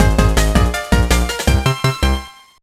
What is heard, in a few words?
WIN